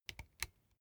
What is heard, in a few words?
bicycle street gear control down